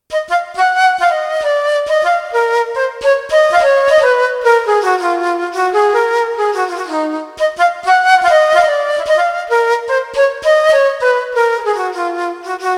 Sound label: flute; Burre; Bach; Ketron